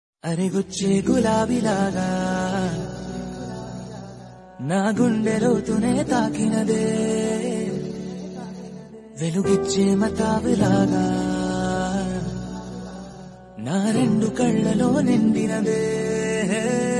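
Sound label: Telugu Song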